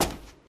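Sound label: footsteps shuffling on stone left; The Wild Thornberrys Movie; lepes csoszogaskovon bal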